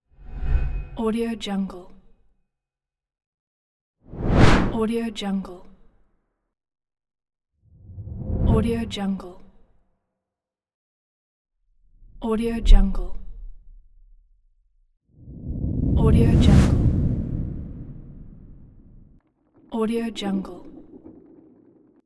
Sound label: cinematic whoosh